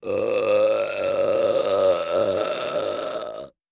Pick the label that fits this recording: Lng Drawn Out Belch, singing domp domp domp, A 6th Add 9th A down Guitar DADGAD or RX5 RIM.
Lng Drawn Out Belch